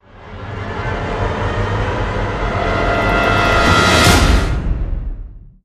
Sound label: STRING SWELL DRUM HIT; transformers war for cybertron gamerip; IACON RUINS